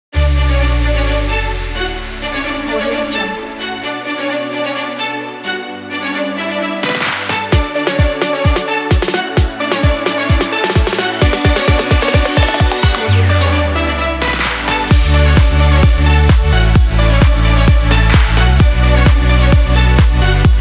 dancing; xrsound

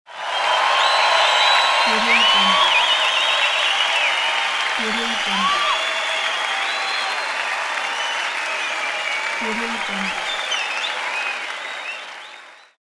cheering crowd

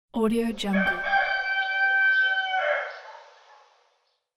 distant crowing rooster

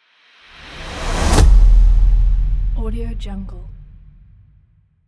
hitting transition whoosh

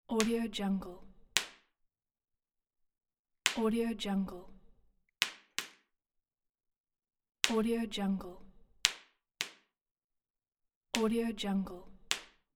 belt slap; dropstudiomarket

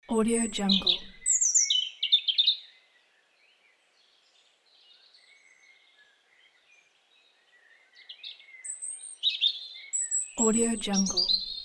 bird

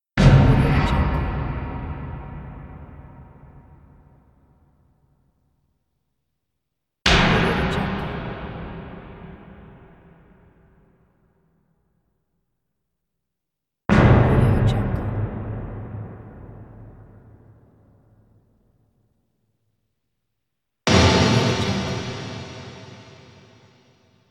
cinematique impact

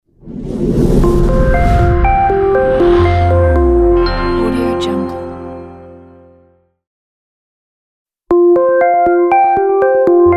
clean logo

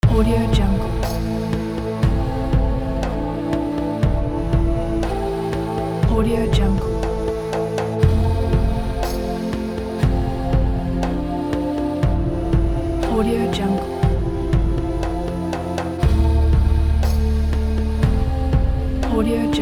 Amazon Flute Loop

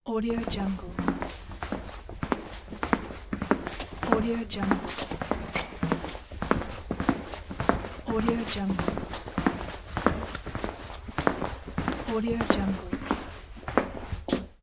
footsteps

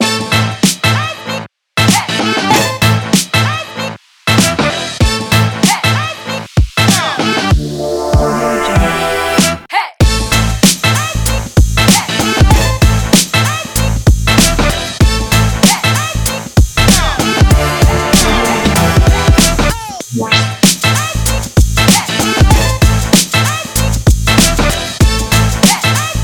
hiphop

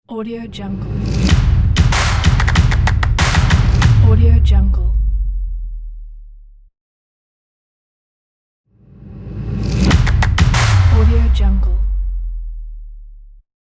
epic drums logo

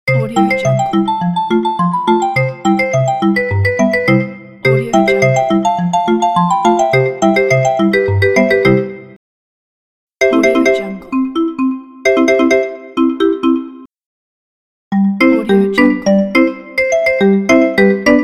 smart phone marimba sounds pack

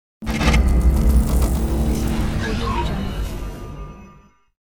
robotic futuristic swoosh

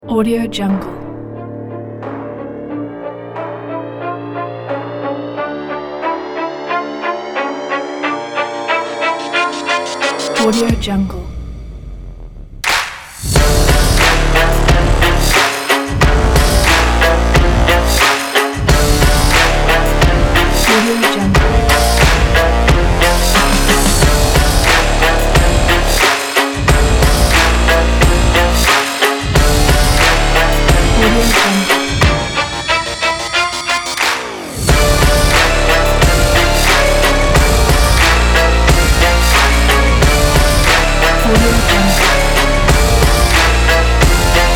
epic sport aggressive trailer

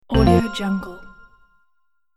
horror notification pickup

